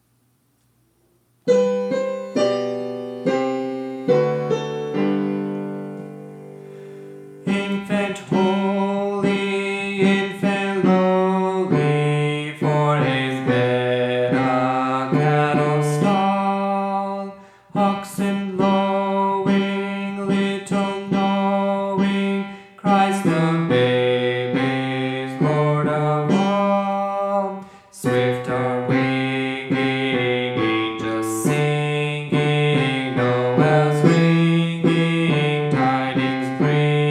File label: Bass